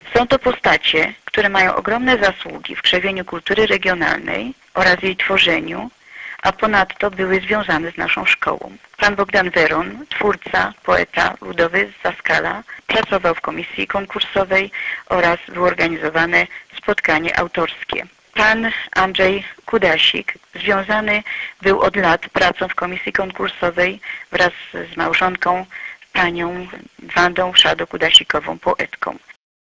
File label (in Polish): skrzyp